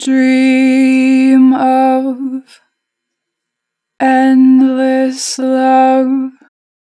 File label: Dream of 4 bar vib; Harmor Vocals